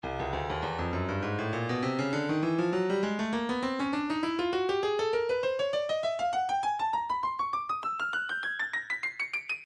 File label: breakbeat midi 100 bpm afrostreet; afrostreet c; Stylus Breakbeat Midi Files